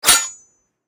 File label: Sword Hit